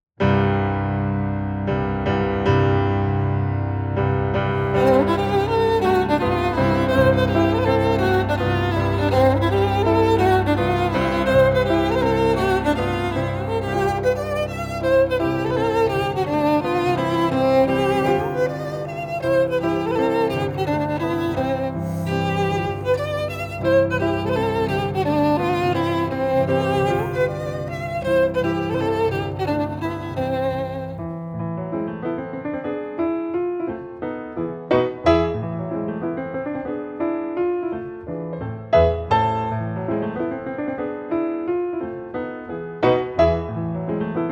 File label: Violin & Piano